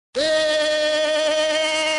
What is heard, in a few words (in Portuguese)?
risada curta; bob esponja